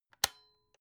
electric whisk switch; haus-garten; kueche

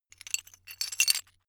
metal pieces clatter short

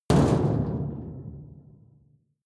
metal impact sheet rumble rattle medium; hits explosionen; metall

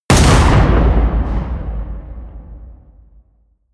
blast, hits explosionen metall

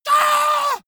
monster growl attack high; schreie

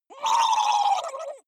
water monster female gargle fear; koerpergeraeusche; comedy-cartoon; soundpool

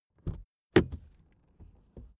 suv open door soft

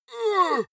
monster growl attack; schreie

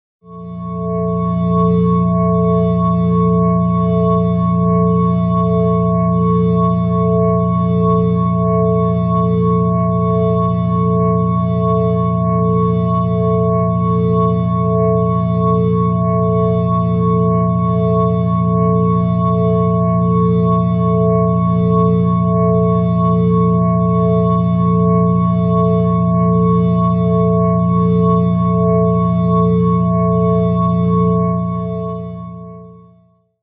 organ pad atonal

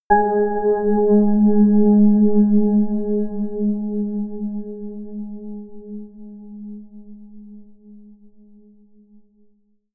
melodic odd soft bell; toene

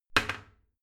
toilet seat slam heavy; haus-garten; badezimmer

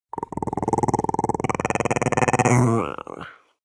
chihuahua growl strained; natur-tiere; hunde

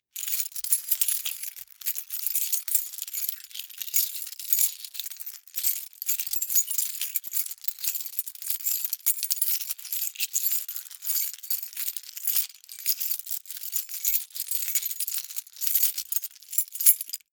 key ring large rattle sequence hard; objekte; schluessel

